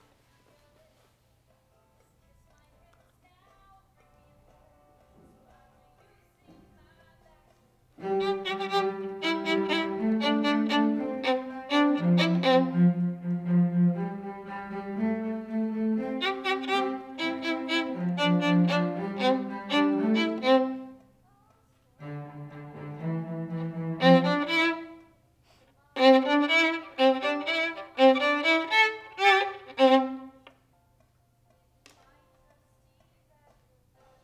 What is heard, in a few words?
violin